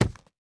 錾制书架 插入声

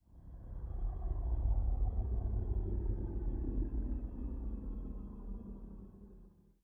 下界荒地低沉声; ambient

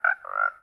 青蛙待机声

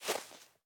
细雪踩踏声

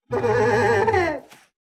开心声; 嗅探兽

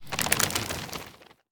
待机声; 嘎枝

